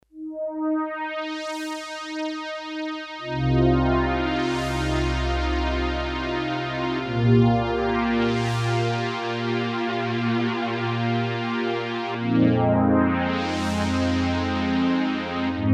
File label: Sweeper, M-Audio Venom